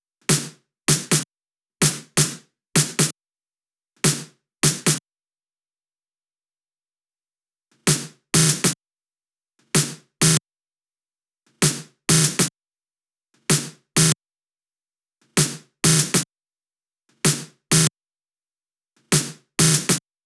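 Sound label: snare Loop, Drum Loop 128BPM; VEC5 Drumloops; VEC5 Multi Loops; Loop Elements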